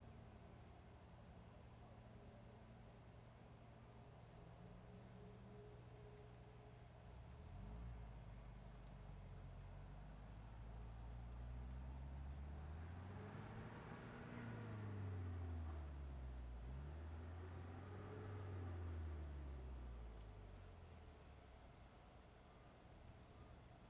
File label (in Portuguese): Caminhonete passando acelera ainda longe, para um pouco e depois continua; maquinas